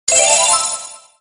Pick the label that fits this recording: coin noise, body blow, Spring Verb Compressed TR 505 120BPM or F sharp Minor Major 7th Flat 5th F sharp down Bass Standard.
coin noise